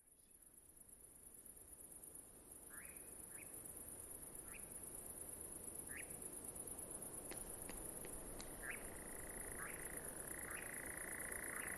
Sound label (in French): engoulevent